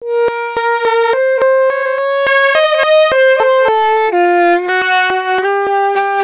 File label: rev Sax Stak